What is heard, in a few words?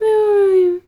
voice fun small character emote sad; Gamemaster Audio; Fun Character Voices